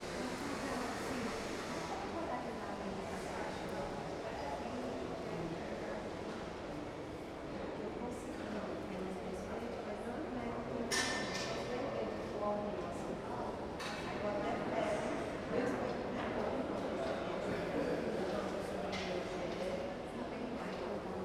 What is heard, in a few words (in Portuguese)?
Ambiencia anexo 2 camara dos deputados hall com pessoas e esmerilhadeira; colecao sonora do cerrado